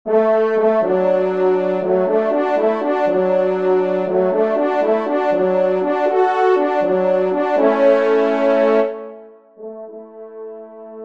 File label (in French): trompe; Dans la Foret